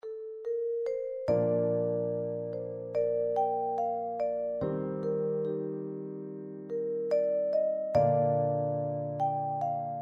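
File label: vibraphone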